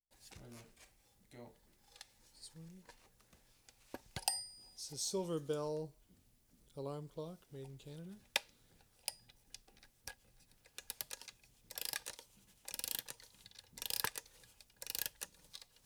"SILVER BELL" ALARM CLOCK, winding, alarm (distorted), ticking